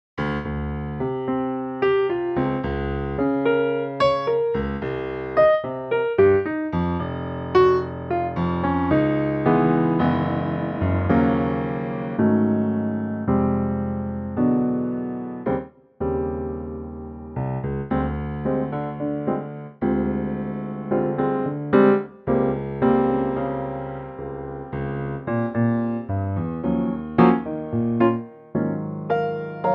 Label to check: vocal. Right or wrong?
Wrong